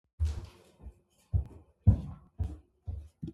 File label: staircase